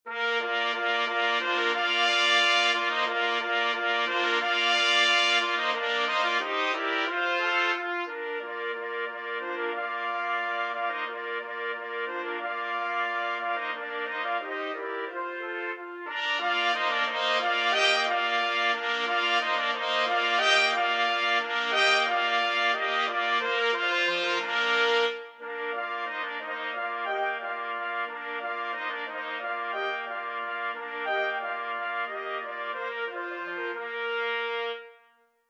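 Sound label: 4 trumpets; trumpet quartet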